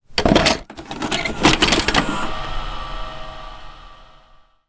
tape insert

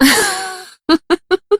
giggle

